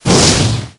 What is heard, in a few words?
fireball hit